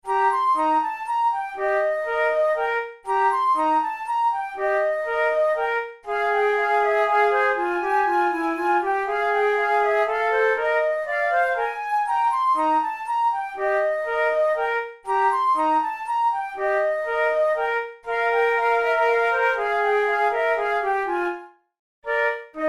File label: methode de flute; tulou